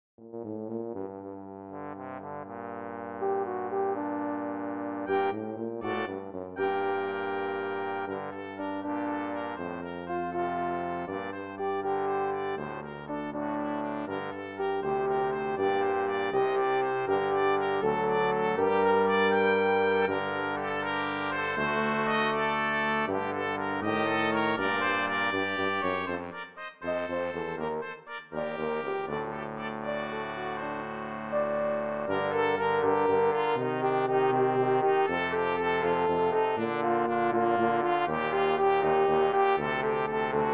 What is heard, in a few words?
baroque